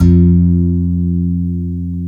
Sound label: Guitarron F1; Multisamples; Digital Sound Factory Planet Earth